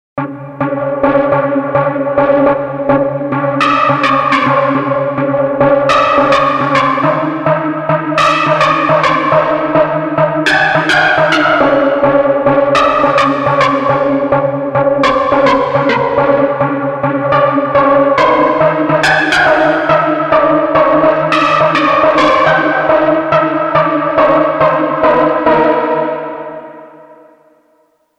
Psychiatric lead

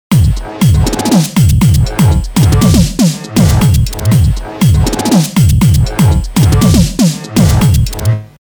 Snapp

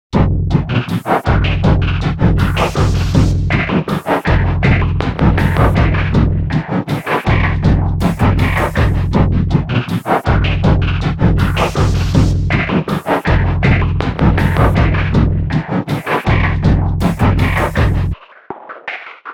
Walking in mud

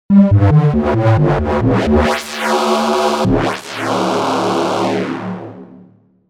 Motor tunnel

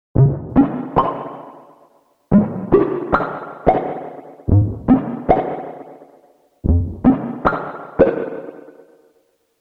Bloop Bloop Bloop

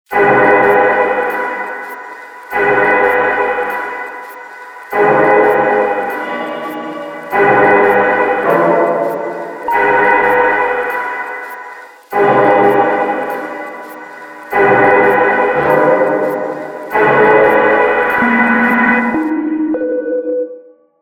Drunk keyboardist

